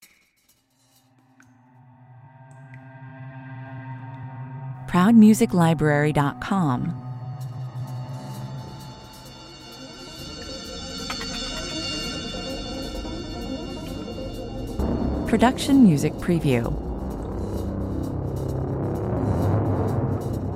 Time is running out and the pressure is on to get it done in this electrifying, 130 bpm; soundtrack that is full of adrenaline and energy.